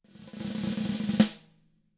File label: ROLL, SNARE ROLLS; ILIO; Double Platinum Drums 1